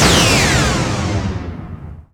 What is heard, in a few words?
hit, IMPACTS & HITS; Techno Trance; FX & ATMO; Techno Trance Essentials